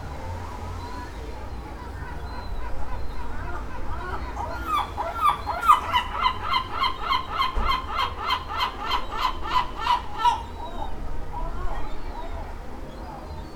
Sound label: seagull sound